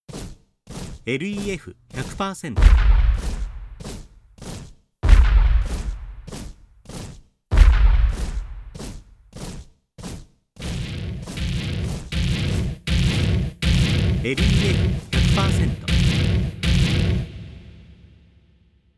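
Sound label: taihou, cannon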